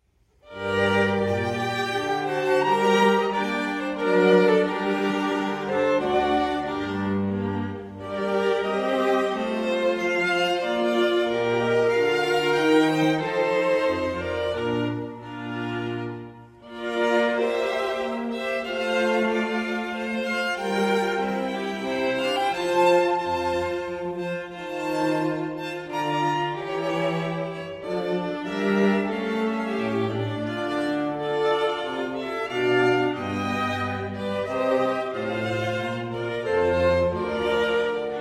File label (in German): Weihnachtsmusik Streichquartett